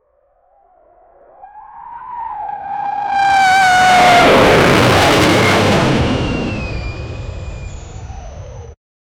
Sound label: realistic flyby of a, jet